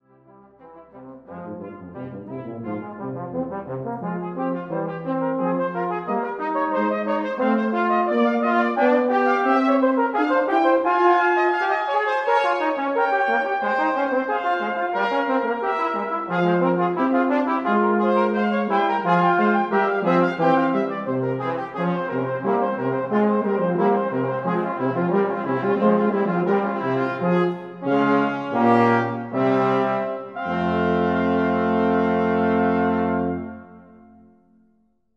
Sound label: Fugue in g minor, brass quartet